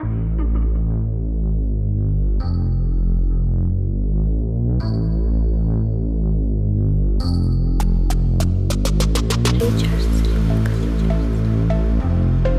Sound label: PHONK HOUSE